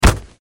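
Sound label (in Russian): попадание пули в игрока; Ещё вариант попадания в игрока контер страйк; cs go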